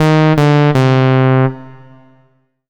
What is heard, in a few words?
MUSIC EFFECT Solo Clean Sawtooth Negative (stereo); startide macos switch windows gamerip 2017